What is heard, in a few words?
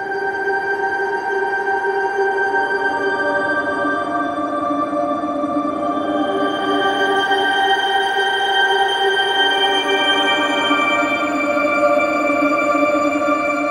Pad G; Trap and Dubstep; Arctic